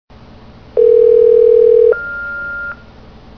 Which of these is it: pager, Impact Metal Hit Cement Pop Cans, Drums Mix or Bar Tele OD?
pager